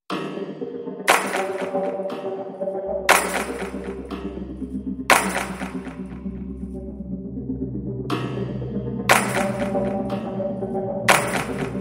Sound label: curio music; Risk of Rain music pack; in-game music